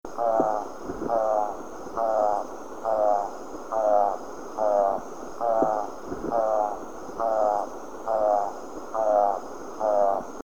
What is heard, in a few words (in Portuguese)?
canto da aracari poca